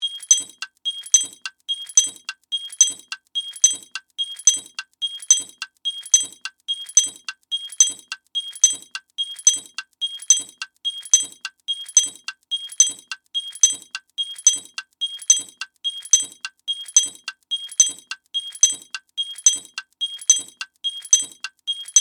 Steampunk Gear Move, Scifi, Steampunk, Gear, Movement, Device, Loop, Science, Fiction; BlastWave